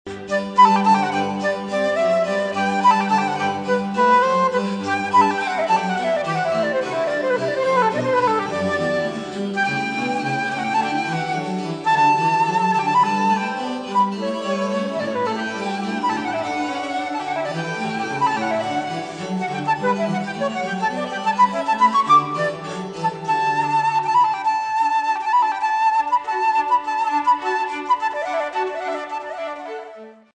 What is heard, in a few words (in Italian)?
flauto; tartini